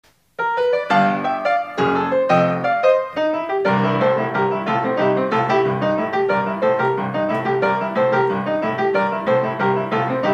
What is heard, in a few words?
boogie woogie piano improv